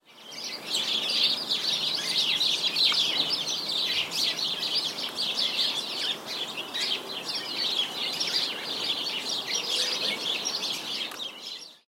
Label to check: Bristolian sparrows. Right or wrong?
Right